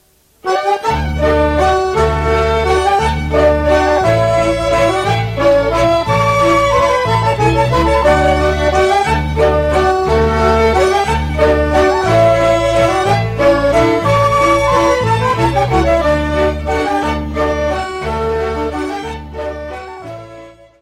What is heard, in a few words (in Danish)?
VALS; Danemark